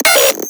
synth hit Computing Noises; Virtual Riot

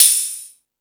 Space Drums, Drum Machines

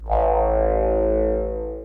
ethno, sound ethno; Ueberschall; Techno Trance Essentials

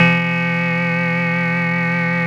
OC-NZ BP C2, SYNTHESIZER

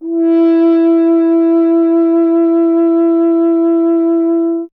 F. Horn

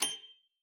F#7, HARP; Xsample VOL-8; HARP P+MF

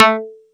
Funky Pick a3, Yamaha YX81Z

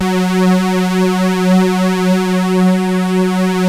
JUN 106, BASSES; Masterbits - Vintage Voltage; VV BASSES

